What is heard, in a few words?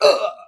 Man Die; gangsters; English